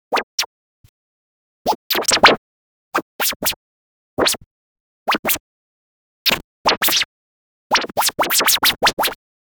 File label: cartoon pie splat